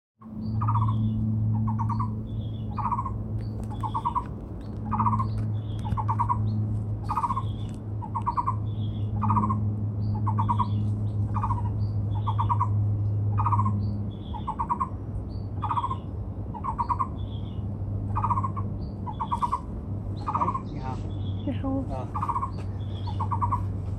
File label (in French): Montagne elephant, taiwan